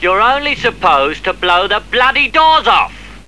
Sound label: doors off